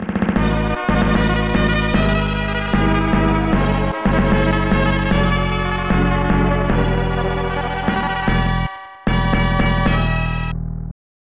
Round Start; King of Rally; SNES

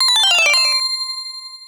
Logo; Dragon Knight 4; X68000; elf Logo

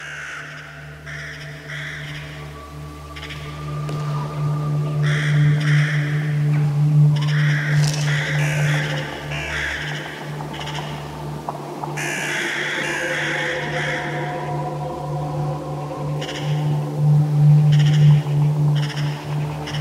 Water Valve; besiege

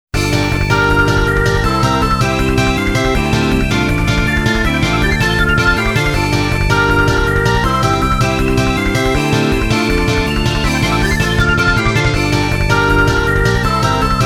Uptempo; rainy blue originalsoundtrack drama

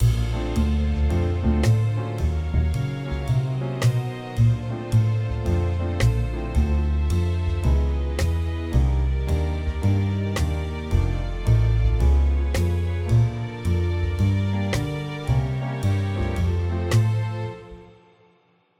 Swing String; tintin reporter cigars of the pharaoh

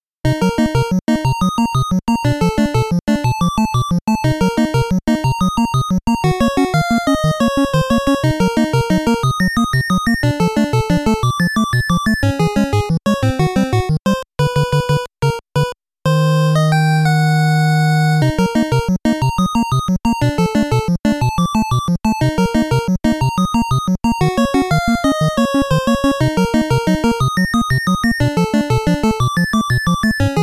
Mazed Music; Gradius 3; SNES

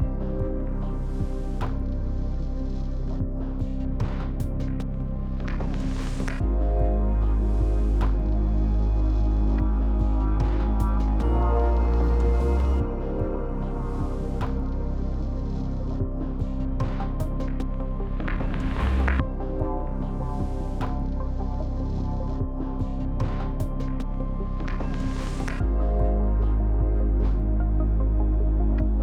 Exploration, 75BPM; TopOfTheWorld

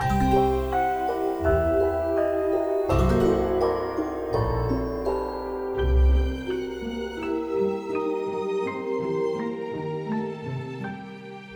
Lose Chime; Amoeba Battle: Microscopic RTS Action

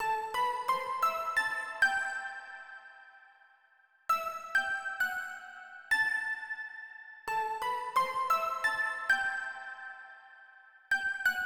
Pluck Melody

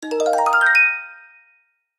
success; Piloteer

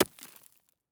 ice hit

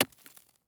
ice hit

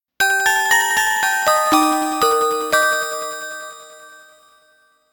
stinger; high strangeness; wii u

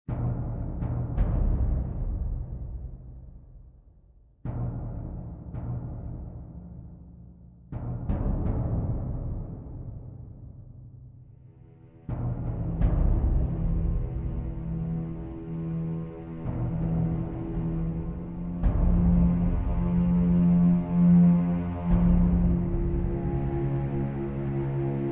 Ambient

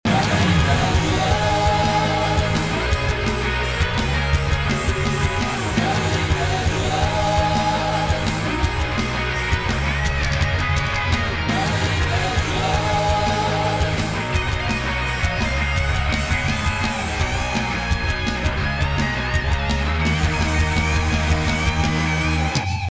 Frontend Music Loop; Gravity Badgers; ps vita gamerip 2014

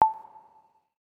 Sine Pluck A2; blek; android; wii u

